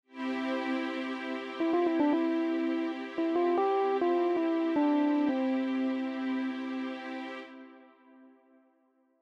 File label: c major mode